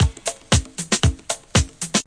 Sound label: crunchy shuffler, 116 bpm, drum loops and breaks